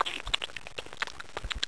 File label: flesh munch; Smile!